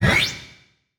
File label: Multimedia Internet CD-Rom Flash Pop Up Short